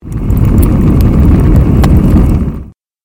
monster flame

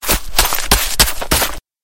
monster dirt burrower

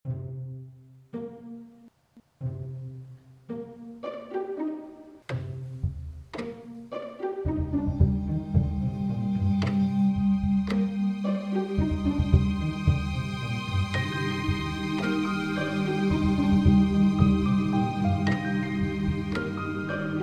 cave calm, music

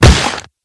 hit blunt

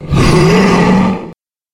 monster yetti

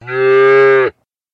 cow

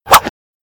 miss

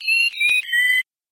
monster phoenix

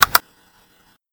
gui click

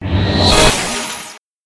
hit holy

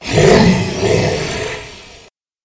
monster dire wolf

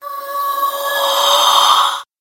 monster spirits

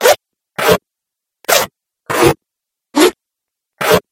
tradeskill tailoring

